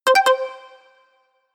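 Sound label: jam, electronic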